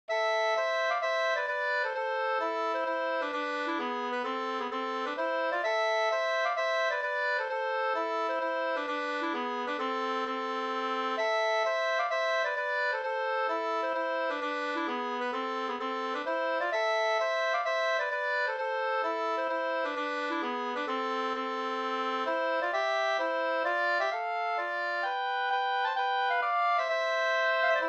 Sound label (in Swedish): hambopolska amoll